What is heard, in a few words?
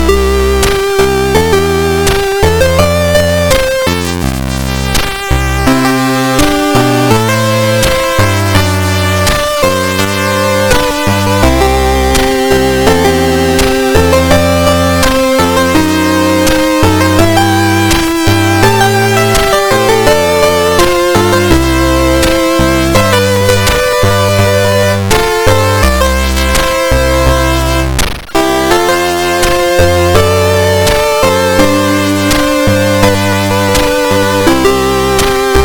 Synth, Demos, SIDVoice